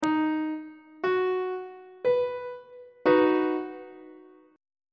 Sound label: c flat major 1st inversion on treble clef